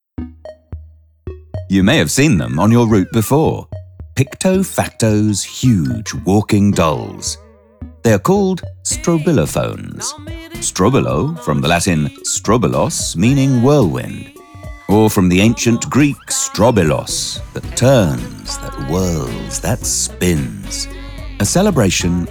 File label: Strobilophone